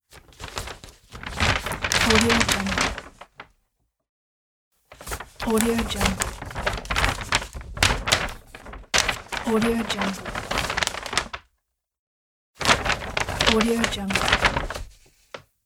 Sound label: paper shopping bag movement